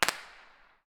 fireworks pop

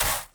sand

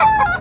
chicken die

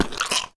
slime die

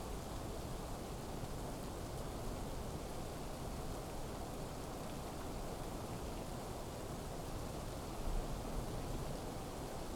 rain inside

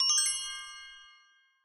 reach goal

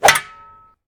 wrench

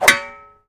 wrench

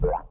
bouncy

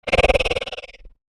spider die

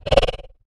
spider die

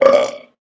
eat finish

